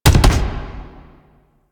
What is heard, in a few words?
Rocket Launcher Punch; Weapons; DE; Warframe; Tenno; Lasrian